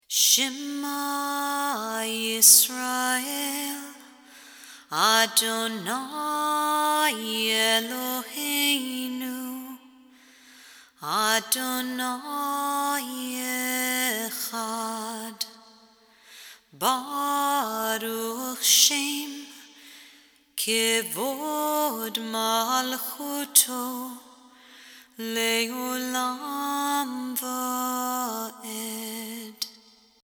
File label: shema sultzer no guitar